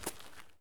walk concrete; Escape from Tarkov

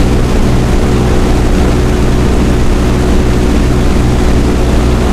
blenheim cockpit, engines; War Thunder

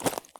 run slate; Escape from Tarkov

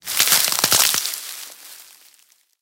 tank collision bush; tanks; War Thunder

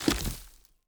pick stump; Rust

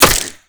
impact wood; weapons; Modern Warfare

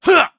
hit; Escape from Tarkov

